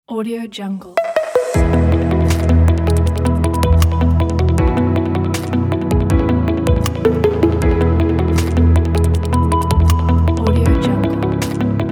ambient glitch logo

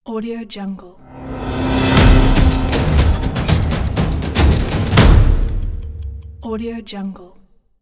news short opener logo; spectrumstockmusic

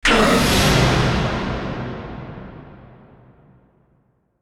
epic metal smash strike

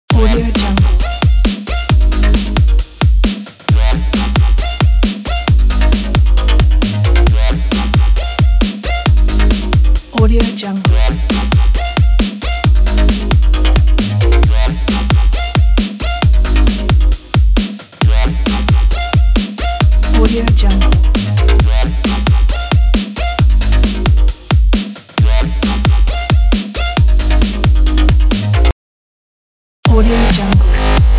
breaks loops pack

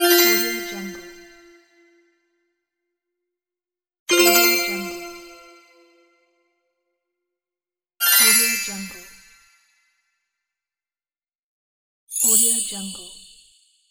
game bonus; game bonus pack; umcsound

